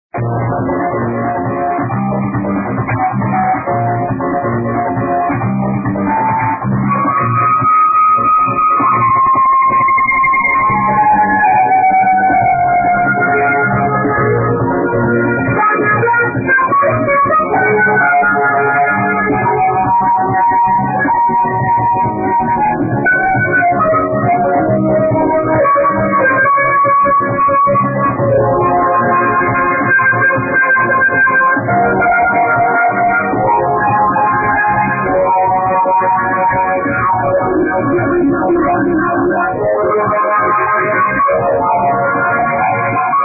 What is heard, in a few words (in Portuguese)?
teclado